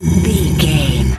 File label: bear roar soft